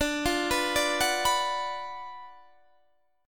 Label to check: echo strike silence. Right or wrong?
Wrong